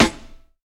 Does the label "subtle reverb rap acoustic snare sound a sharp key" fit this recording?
Yes